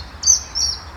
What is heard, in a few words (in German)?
eisvogel male